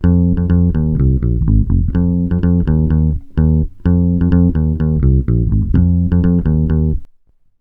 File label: DISCO 126 A, Bass Grooves; USB Soundscan vol.30; AKAI